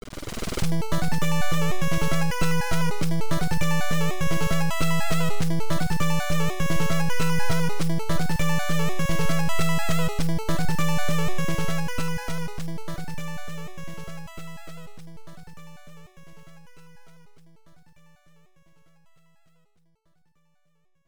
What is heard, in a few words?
Boss Clear; Lone Ranger; NES